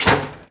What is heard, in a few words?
hatch close; doors elevators; Star Wars Obi-Wan; XBOX